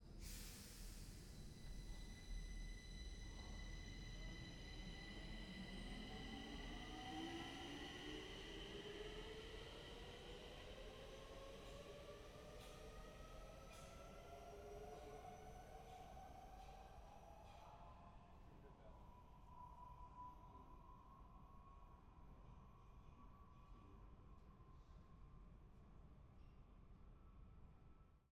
Subway - Stockholm - Exterior - Away, Slow; Pole Position; Subway Station; Holophone

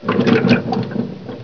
swim, player; hl2

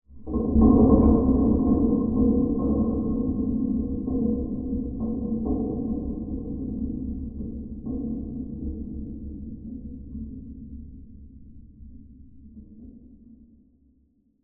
Deep Metallic Rattle, Tense, Epic; Jake Fielding; Haunted Metal Vol.3 - Cinematic Tones & Textures

